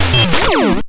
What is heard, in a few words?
Blast; SideArms